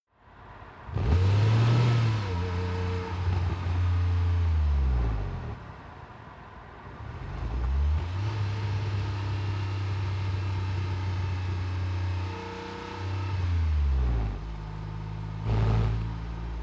sports car int engine various sequences; verkehr transport; autos

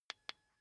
car key button click signal

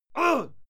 male fight yell short

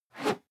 whoosh whistle fast; swooshes-swishes

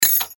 drop key; haus-garten

